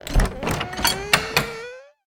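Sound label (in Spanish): ABRIR PUERTA, open door; yesterday origins gamerip 2016 switch ps4 pc ios macos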